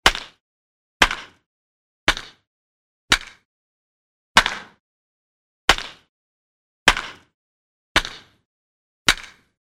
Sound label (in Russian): udar po mjachu bitoj v bejsbole